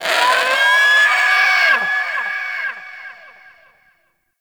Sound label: Bird Of Prey Captured Lift Away; Hunting Critters